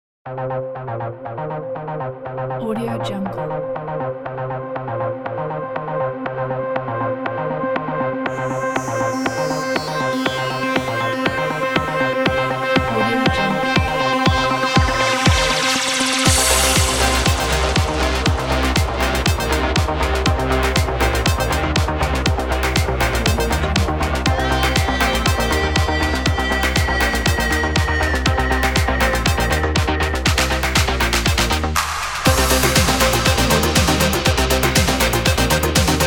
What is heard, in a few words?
synthwave drive